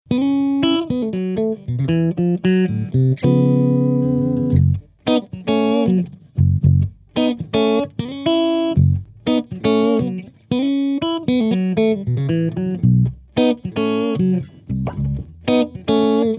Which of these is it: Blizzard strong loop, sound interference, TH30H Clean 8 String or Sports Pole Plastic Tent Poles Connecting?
TH30H Clean 8 String